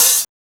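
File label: OHH, open hi-hat; X-Static Goldmine 1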